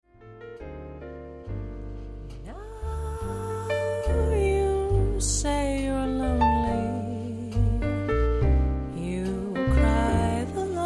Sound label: big band